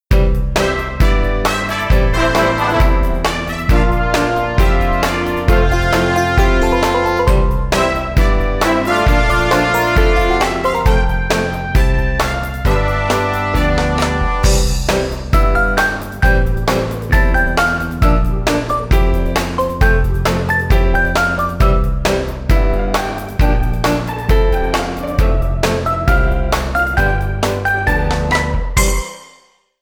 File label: Music Menu; Army Rescue; Wii